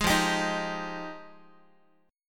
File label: F Minor 9th F Guitar; strummed; JGuitar